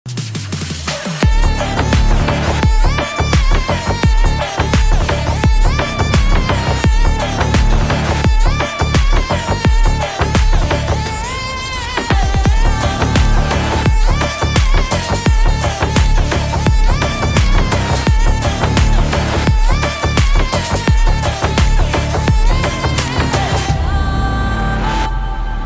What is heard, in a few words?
ringtone